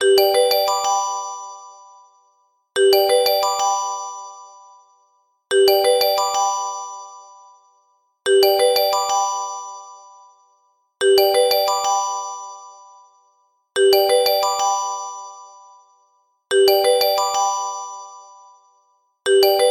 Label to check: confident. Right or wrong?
Wrong